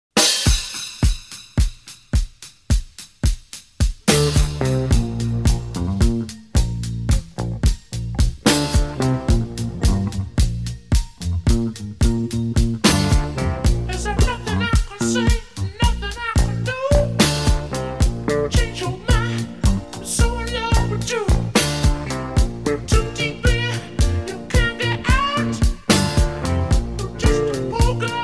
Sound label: Ringtone; Coolringer